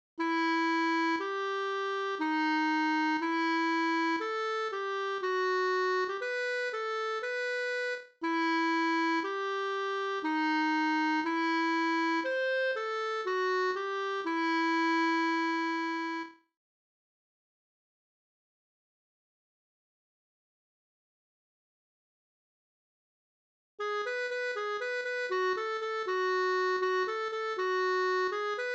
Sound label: kazoo